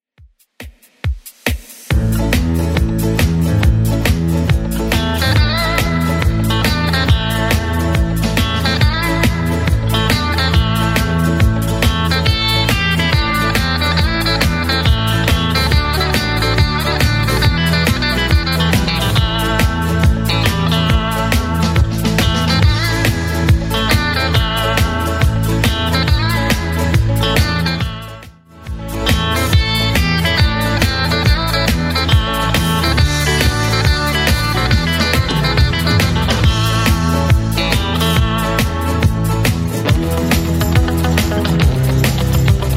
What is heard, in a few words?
60s Redrum 139bpm